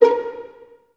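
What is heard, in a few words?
Violins A#4 pizzicato, String Ensembles; 23 Violins; Miroslav Vitous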